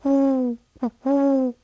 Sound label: OWL